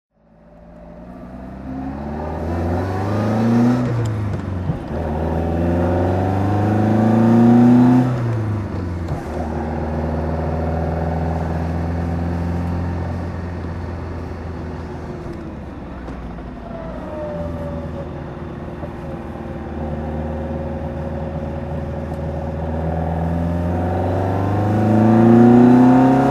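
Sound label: lotus elite s1 driving, engine sound; Lotus Elite series 1, 1960; V3D/ ClassicarImages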